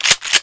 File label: handgun clip in